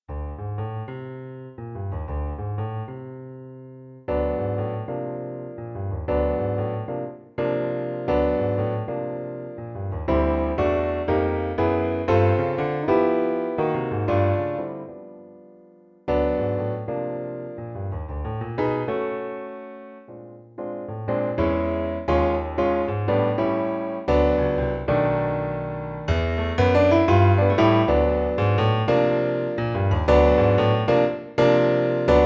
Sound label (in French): boogie Piano seul, Jazz